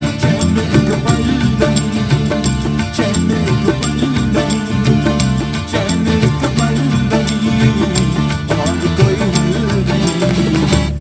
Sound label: music